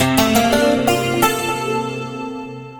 music 12string guitar, notifications; Samsung GT i7680

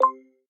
click; Google Nexus Player

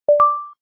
Wireless Charging Started; China Mobile; A4s

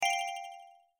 Arrive, notifications

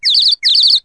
music lark, notifications; Samsung GT i7680

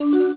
Movie Start, Camera

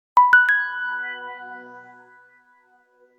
Alarm, Nokia tones digital; N79

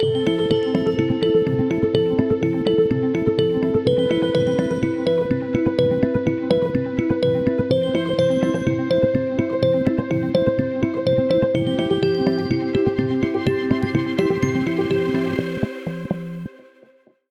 White Water, ringtones; Motorola; Edge 70 Max China

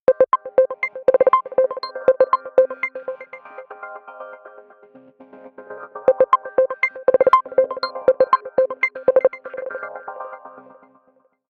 Scan, ringtones; Tecno; Pova 7

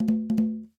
Tambourine, notifications; BQ (Russia); BQ-4072-Strike-Mini

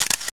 Shutter; KV510; cam; LG

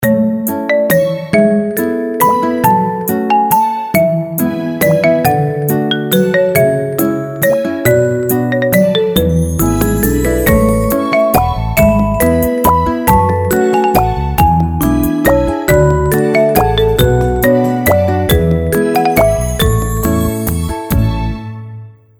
Ringtone; Unihertz; Jelly Pro

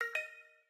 mms Sent

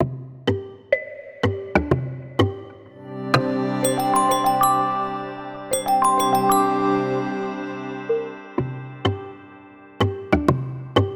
Wood Dance, ringtones; Honor; 600 Pro China

